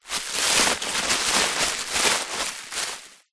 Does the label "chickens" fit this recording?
No